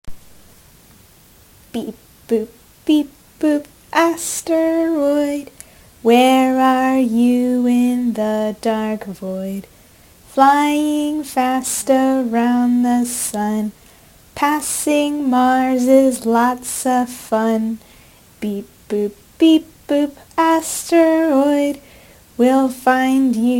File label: Beep Boop; Psyche Inspired; Asteroid